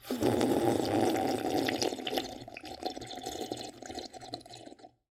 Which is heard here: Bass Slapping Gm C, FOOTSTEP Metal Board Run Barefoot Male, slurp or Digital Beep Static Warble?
slurp